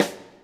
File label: SNR, DRUM ZONE; Masterbits - Total Zone